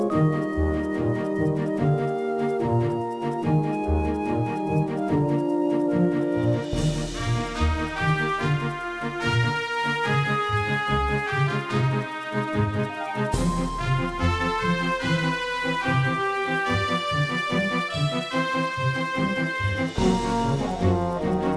Musicloop; Pokémon Masters Arena

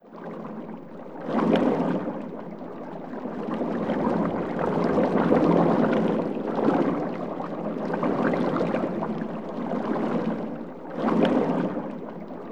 Bubbling

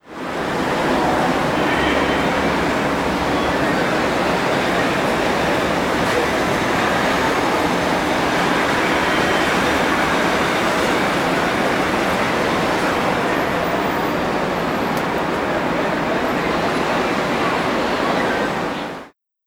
paris City; Cities

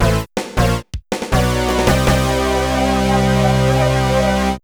Game Over (Alt); Shutokou Battle Gaiden Super Technic Challenge; PS1

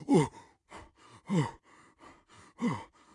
Guard Start Run Stumble Breathless

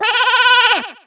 Sheep VO

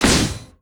Wall Bound Spball; disney star smash android ios mobile gamerip 2020

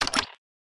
Open Event Detail; subway surfers city sound effects; Android, iOS

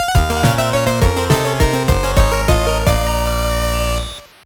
Stage Clear; Bloodstained: Ritual of the Night

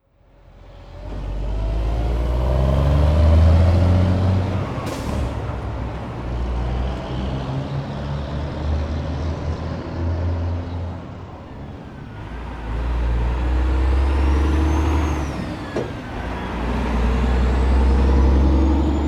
Golden gate bridge Traffic

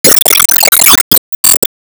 Enemy Factory Active Hit; Disney Star Smash; Android, iOS, Mobile

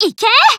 Shot Skill; disney star smash android ios mobile gamerip 2020

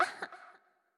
Hurt; Winkubus

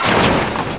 Car

